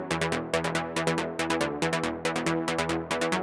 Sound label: Bassline High 140BPM, Eminor; Vengeance Samples